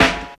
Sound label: warm snare single hit f key, snare drum tuned to the F note